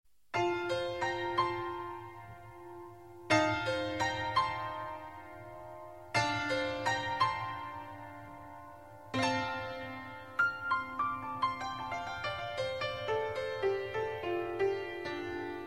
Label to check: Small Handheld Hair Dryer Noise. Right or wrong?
Wrong